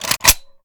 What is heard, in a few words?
reload empty fast mag charge; gmod